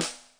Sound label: Elem, Electric Snare, Yamaha DTXpress